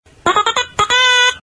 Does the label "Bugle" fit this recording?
Yes